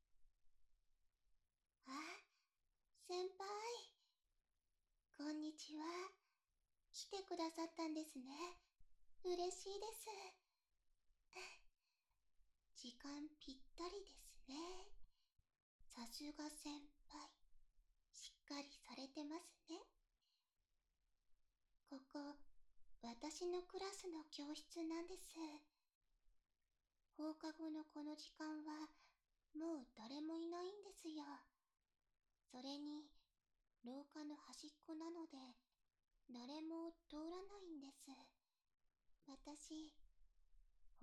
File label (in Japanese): 教室ロッカーで隠れて耳舐めマッサージ, ASMR; リアルサウンド; SEなし